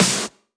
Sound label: snares; Vengeance Samples